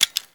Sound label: button click; Tabaluga's Print & Play